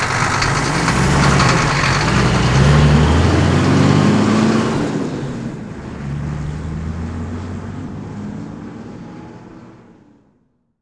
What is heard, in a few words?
truck; AKAI S-Series CD-ROM Sound Library VOL-6